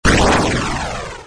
Boss die Snd; Robot Wants Kitty

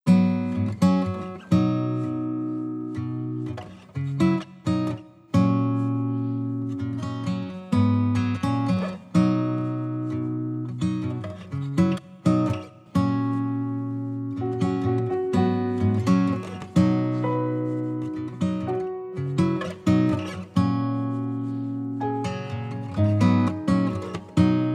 lounge; IKEA Smart Home Planner

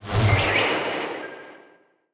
Skill Start Magic; Mother Nature

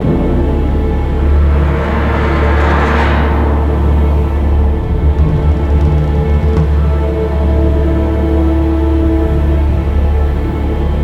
Siege Field Melody

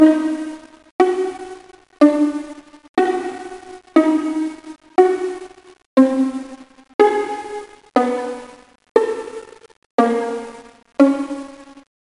Loop

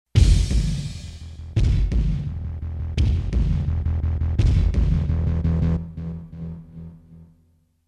Judgment MUSIC; buriki one world grapple tournament 99 in tokyo

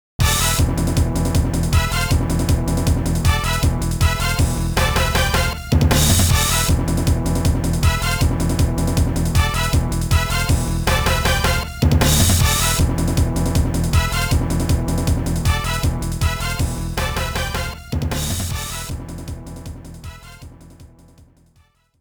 Stage Opening

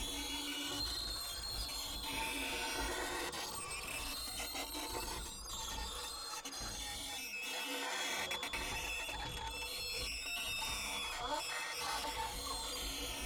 Scratching On Window Loop; The Town; Intro Sequence 05b

